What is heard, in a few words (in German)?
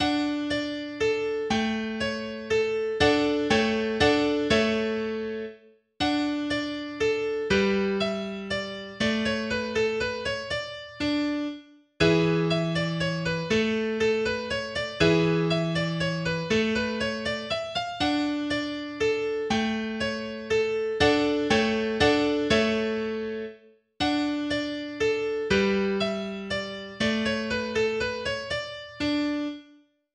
Arietta, mehrstimmige Gitarrenstücke; Küffner